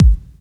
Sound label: Bassdrum; Best Service; House Definition VOL-1